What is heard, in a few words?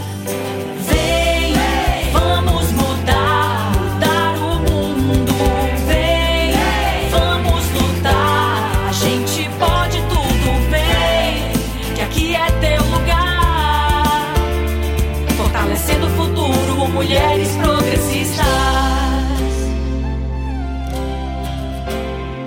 jingle